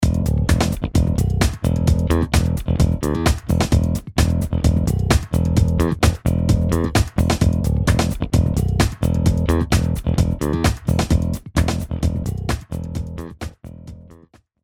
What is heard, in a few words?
Picked Bass; Virtual Bassist Phrase Demo